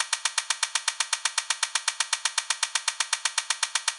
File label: bigwin counter loop; DraculasCastleWD; wazdan